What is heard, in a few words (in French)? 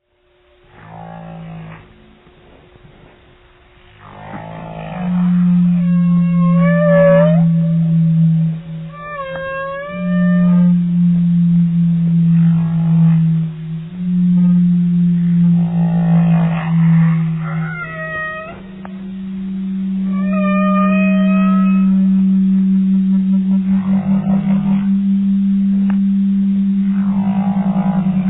sonar baleine